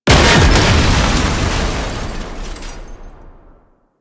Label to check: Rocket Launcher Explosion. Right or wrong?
Right